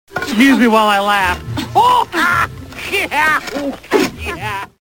laugh

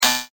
BUZZER

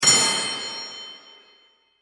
anvil wood

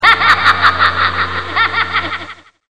laugh

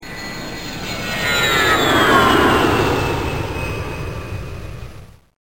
jet noise

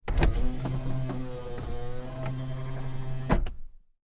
Car Window Open

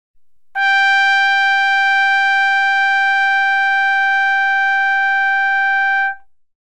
C trumpet G5

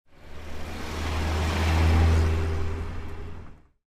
Car Pass By Slow

